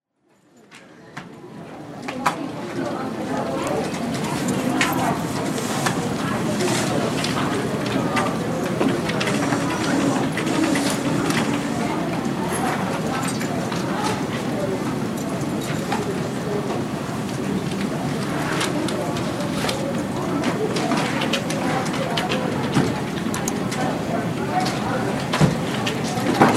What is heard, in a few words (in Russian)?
zvuki biblioteki